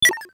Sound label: Videogame MMC removal; Nokia; Audio themes